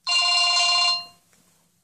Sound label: Basic tone